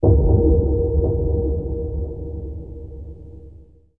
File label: Atmos; In the Line of Duty Firefighter